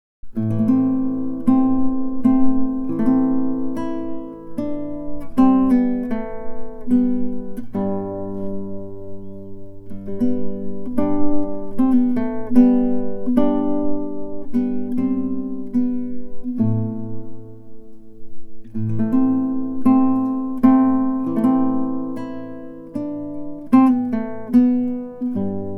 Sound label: Guitar